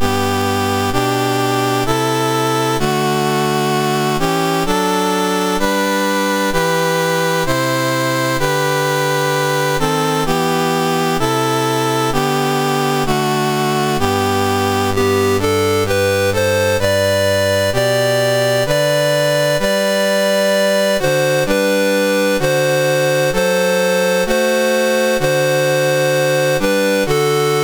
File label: MOS6581R3, National Anthems; Liechtenstein; Dave Marquis